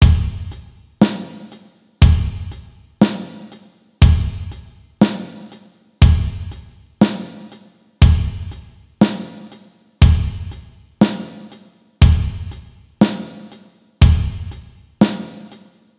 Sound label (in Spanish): Ritmo Basico a 120, bateria; ritmo basico de bateria en varias velocidades